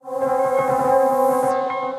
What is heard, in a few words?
Piano & Keys; Cezanne